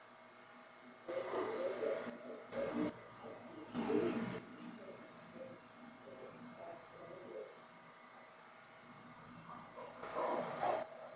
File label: Chapel Static